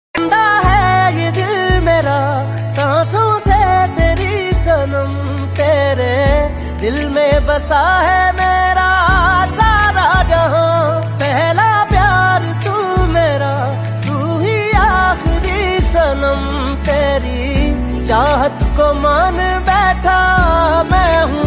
song ringtone romantic ringtone punjabi hindi ringtone